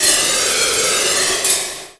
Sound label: spin; Earth and Beyond; EA Games